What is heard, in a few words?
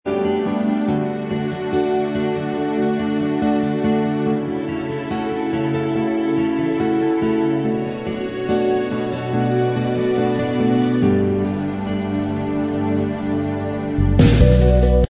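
instrumental track, backing tracks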